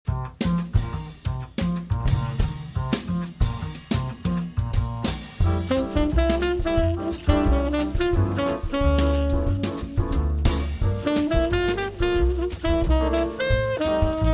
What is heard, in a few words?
jazz quartet